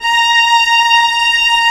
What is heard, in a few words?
Vla A#4; Digital Sound Factory Virtuoso